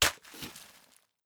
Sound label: unhide hatch